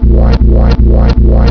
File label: echoing